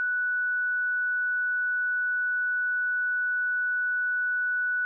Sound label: flash